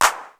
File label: RY-22 DANCE, DRUMS PERC YAMAHA; Rubber Chicken Software Sample Bank